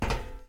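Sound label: Run Left Metal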